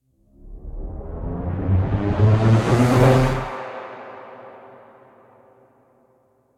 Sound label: Riser; Robert Hits Goon With Gun